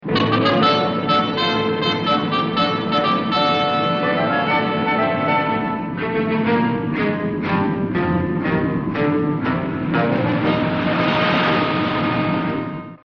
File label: Supercar on the highway